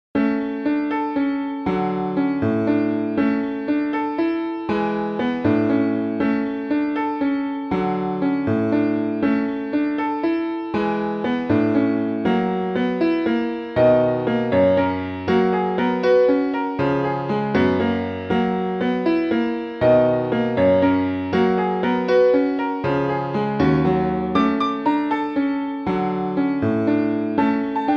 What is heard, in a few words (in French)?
Etude Pour Piano Numerique